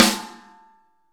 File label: SN ambient; Best Service; Real Mega Drums VOL-1